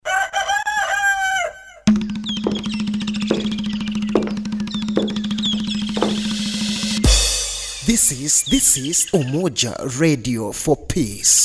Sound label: Radio Jingle; BUS